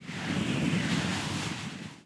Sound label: windfist cast